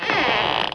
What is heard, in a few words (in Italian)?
porta apertura